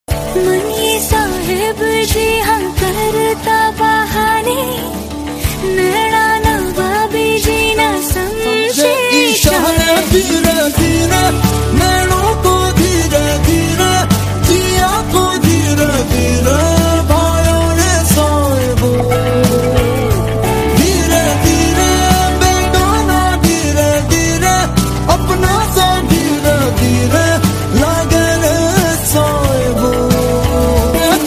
Romantic Song Ringtone

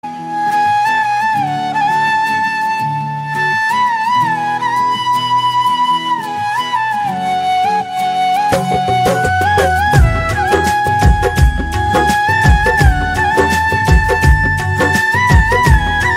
Flute Ringtone

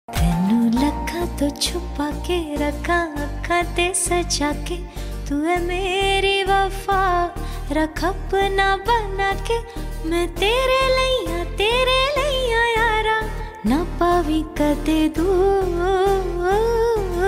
Female Version Song Ringtone

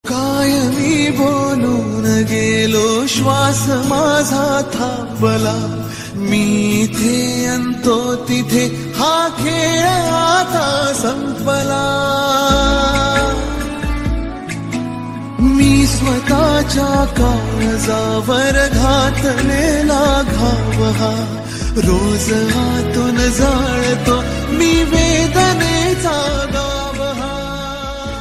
Marathi Ringtone

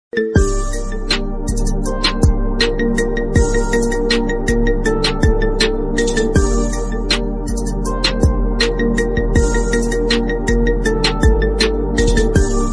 Apple iPhone Rockstar Ringtone; ringtones

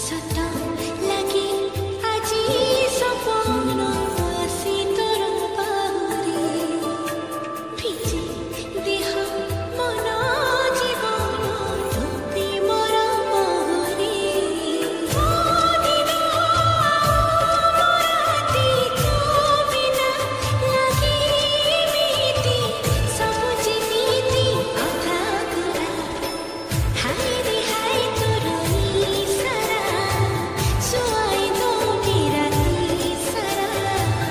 Odia Romantic Song Ringtone

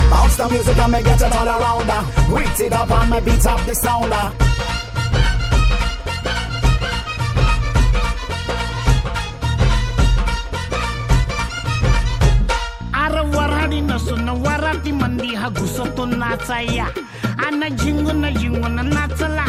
Remix Song; Ringtone

